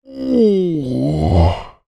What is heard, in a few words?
human male groan pleasure; zapsplat